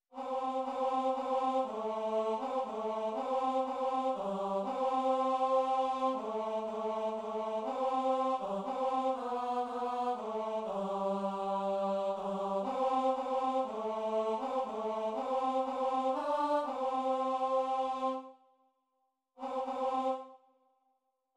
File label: tenor